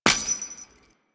Step Default, footsteps

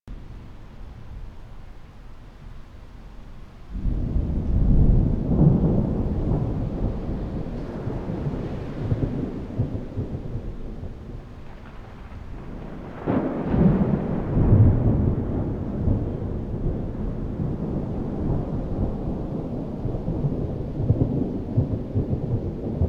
Plains Generic Thunder Loop; Ambience; Props; Loops; Eidolon; Warframe

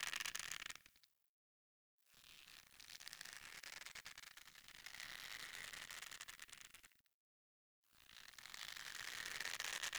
Spike Trap Rope Creak; Objects; Ode Lantern Crate

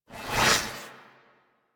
Mod Cycle; DE; Warframe; Riven Mod

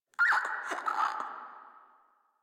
Infested Crawler Breath; Warframe

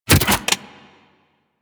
Briefcase Launcher Reload Passive; Warframe; Weapons; Corpus

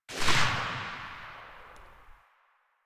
Pistol Tail; Warframe; Tenno; Lasrian

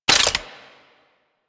Rocket Launcher Low Clip Mech; Warframe; UT Weapons

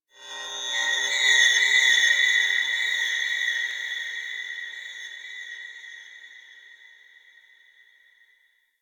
Texture Medium, Ambience; Sentient Lair; Warframe

